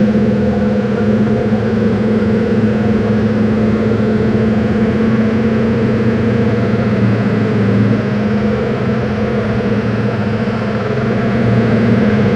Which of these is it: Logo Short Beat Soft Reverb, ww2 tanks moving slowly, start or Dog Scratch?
ww2 tanks moving slowly